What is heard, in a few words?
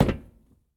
metal step; Golden Crayon Sounds